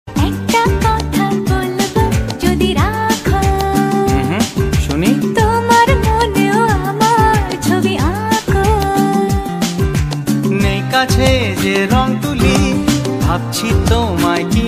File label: bengali ringtone